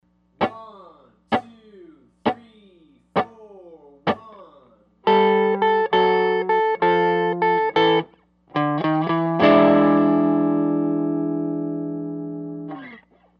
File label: Blues Turnaround in A